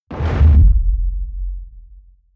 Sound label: heavy sub noise bass